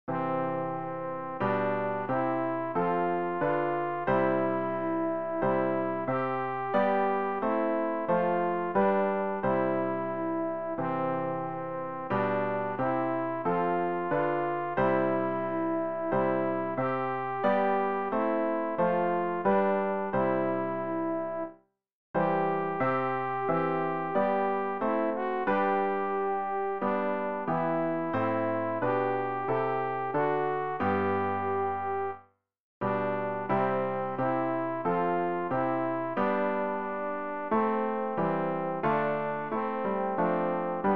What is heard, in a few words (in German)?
alt, Übehilfen für das Erlernen von Liedern; ref gesangbuch; rg 188 du oeffnest herr die tueren